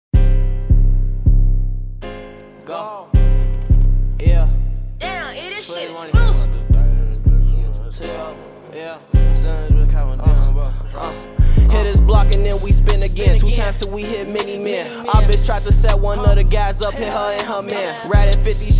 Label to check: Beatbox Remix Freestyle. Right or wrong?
Right